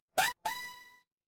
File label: retro game jump